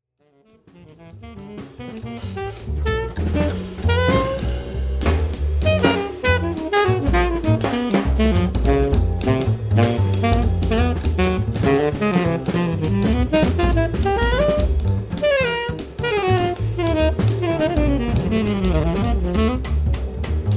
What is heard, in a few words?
Charming Chimes